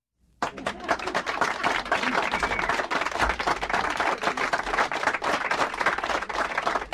encouragement ringtone

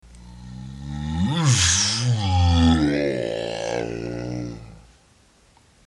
slow motion punch

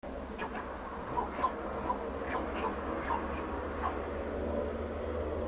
Northern bald ibis; پرنده اکراس سرتاس شمالی

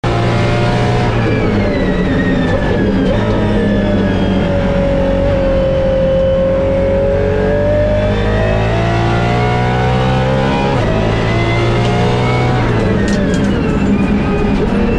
De Tomaso P72

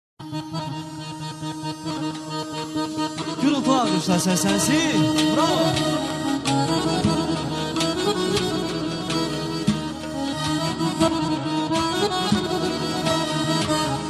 Garmon

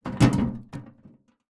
foley metal box old rusty; zapsplat

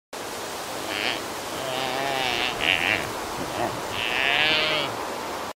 Tibetan antelope; کل تبتی

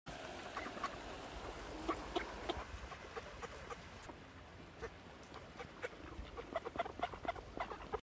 Male and female migratory ducks; مرغابی های مهاجر نر و ماده

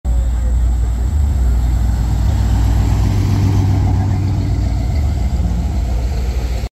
oldsmobile; آمریکایی; الدزمبیل آمریکایی

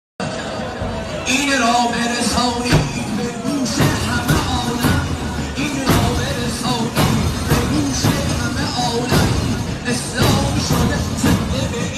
Self flagellation; زنجیر زنی

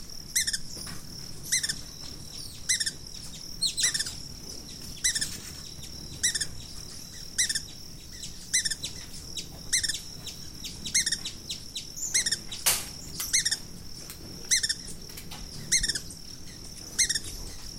Brazilian ruby; پرنده یاقوتی برزیلی

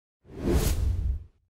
transition fleeting

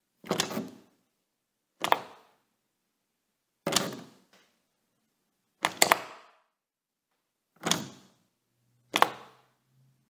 Door Handle

